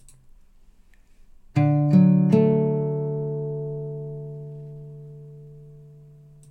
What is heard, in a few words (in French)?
accord de RE majeur, guitare